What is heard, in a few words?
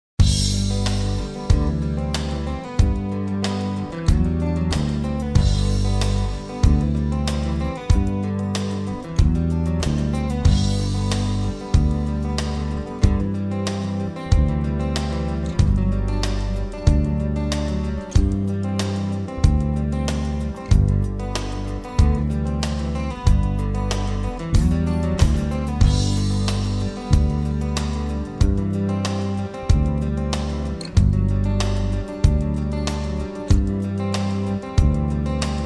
light rock